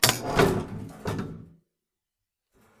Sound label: booth intro; Papers Please